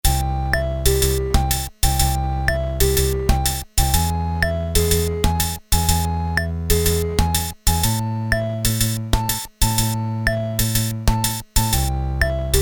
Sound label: swing, Casio SK-1, Rhythm sound examples